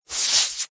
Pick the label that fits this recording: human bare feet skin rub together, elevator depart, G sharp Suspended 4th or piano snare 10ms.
human bare feet skin rub together